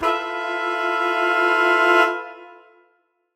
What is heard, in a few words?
Horn Swell Fmaj7b5; undercover samples